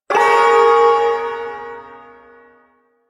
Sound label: Shawzin Phrygian Chord L; Warframe; Duviri Ersatz Shawzin